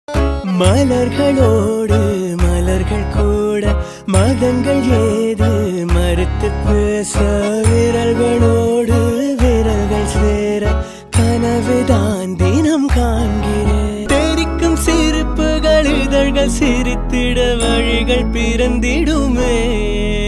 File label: love video song tamil; darling 2